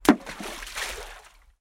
impact stone hit water rockpool beach splash

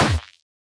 gun tikka t3 battue 308 Stereo Shot; Heavily Edited

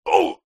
human fight karate vocalisation male

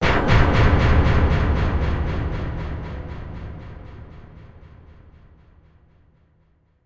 Impact Boom Crash; bottlerocket

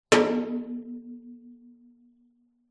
impacts metal drum thin light resonate

